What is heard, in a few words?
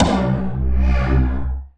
PLOPP, Anime Sound FX; COMIC